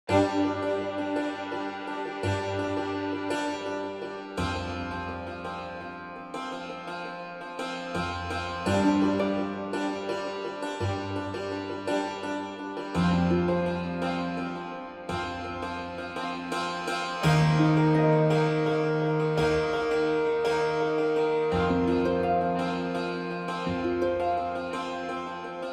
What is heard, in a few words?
greenwood jig